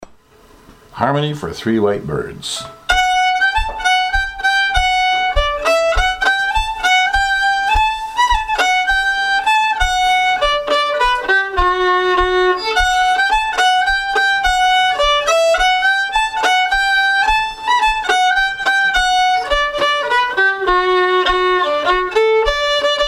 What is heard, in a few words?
harmony birds, violin